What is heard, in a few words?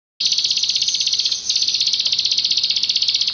Recording, bird